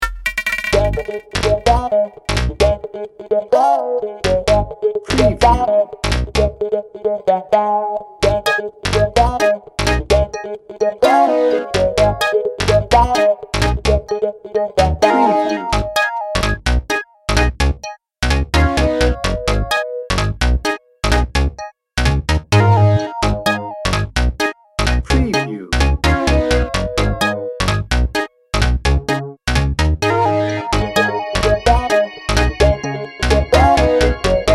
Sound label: booty shaker no drums